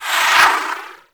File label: Space Fighter Dredger Rocket Proj Whizz By; Grineer